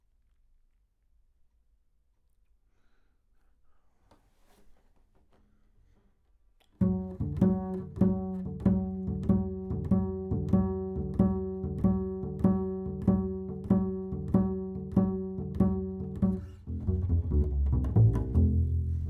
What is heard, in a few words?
Bass